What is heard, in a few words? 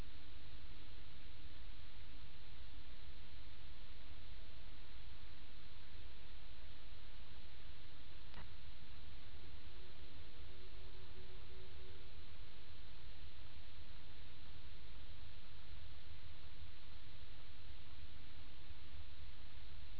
Humpback moan; Passive Acoustic Sensing; HARP data